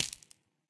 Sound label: footsteps hive; Fate The Cursed King